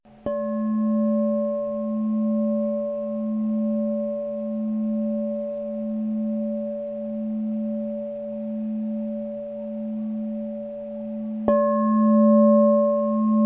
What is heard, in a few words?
432Hz 9inch LOW A +10, crystal bowl